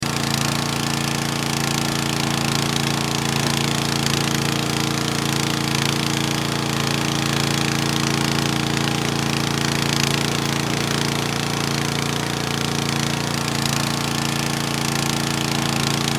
Jaws Of Life, Jaws Of Life Motor Run Loop; Machinery; Emergency

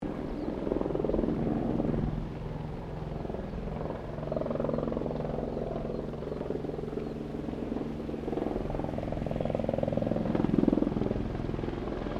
helicopter Bell 204 firefighter passing medium; Helicopters; Vehicles; Aircraft Collection

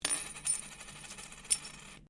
Coin Penny Single Spin Laminate; Common coins; Money; Objects

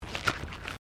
Footsteps Concrete Step; Crocs; Footstep Foley; Footsteps (Sound Effects:Human)

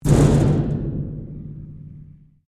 Strike Metal Hollow Tank Rattle; Materials

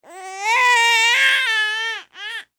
Human Baby Cry Single Long; Babies (Sound Effects:Voice)

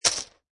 Poker Chip Piles Dropping On Poker Chip Piles; Playing Cards, Poker chips; Card Sound Effects

